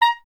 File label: clarinet A#; Miroslav Vitous; Solo Instruments VOL-1